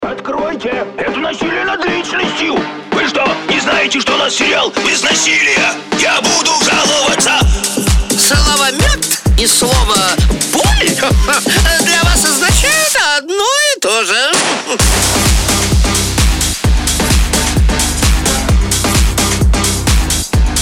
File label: phonk